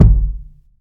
boom bass drum sample g key, Royality free bass drum sound tuned to the G note